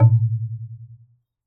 Wooden Bar Strike

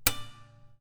Classical Guitar Extended Technique Plink

